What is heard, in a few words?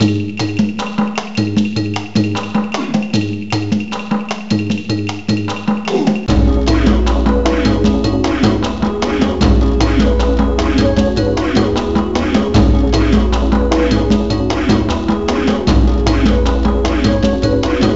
Deep Forest Stage BGM; Joe Mac Returns; arcade gamerip 1994